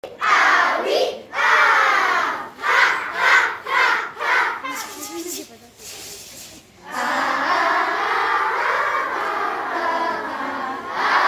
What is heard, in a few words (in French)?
echauffement vocal